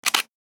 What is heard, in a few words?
Camera Shutter